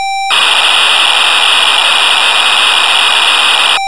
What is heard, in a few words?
Roland Juno 106 factory presets bank b; Synthesizer Factory Patches